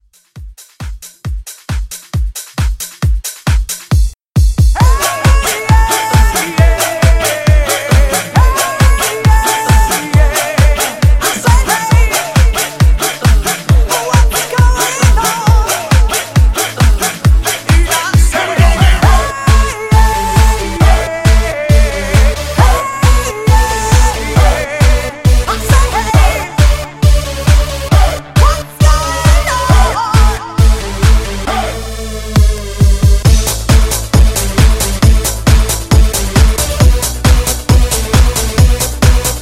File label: 90s Pop Rock Redrum Hype; Remixfreakz